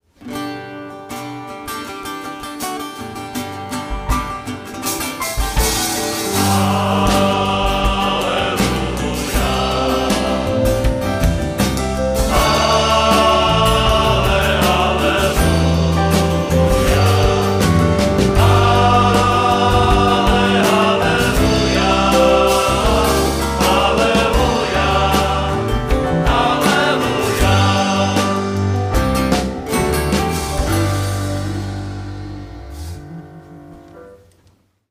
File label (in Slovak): aleluja